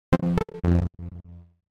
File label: bad connection chaos